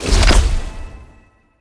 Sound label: Earth Spell Cast; AudioWizards